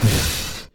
tackle

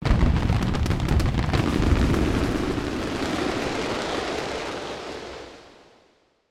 fireworks barrage

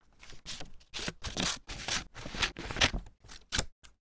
scissors paper

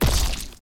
blow, battle

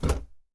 chair sit

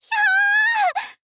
"hyaa", girl

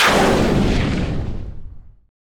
cannon

